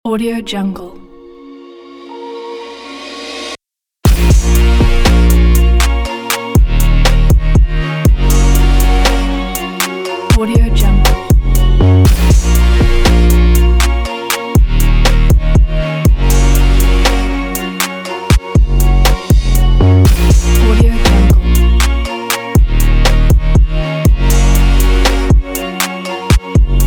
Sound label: the epic hip hop